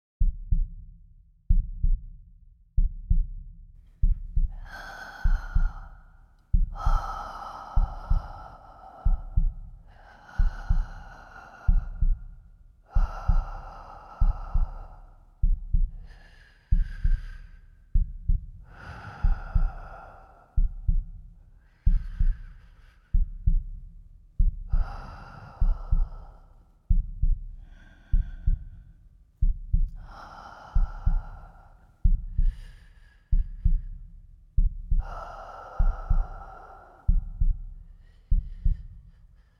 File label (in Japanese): 寝息